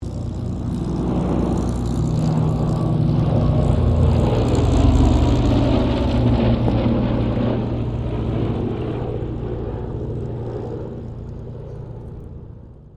AIRCRAFT LOCKHEED L-1049 SUPER CONSELLATION PASS INTERIOR; Connie, Super Constellation; 1955; Airplanes